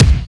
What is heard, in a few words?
HARD DNC K; Drums In Your FACE!